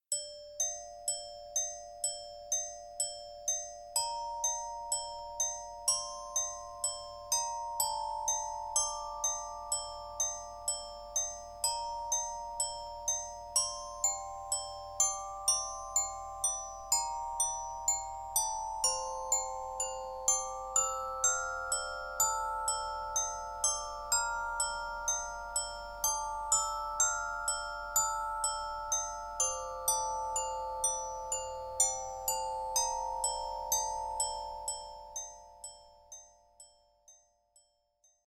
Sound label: Main theme etude